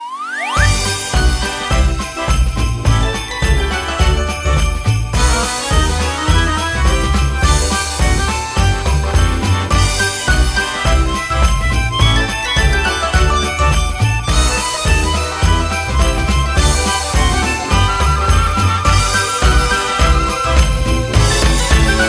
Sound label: bigWinLoop; jingle spin